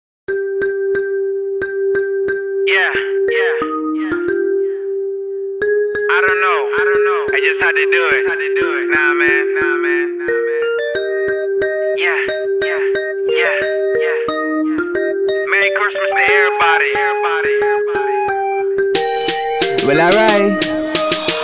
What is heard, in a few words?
jingle bells rapp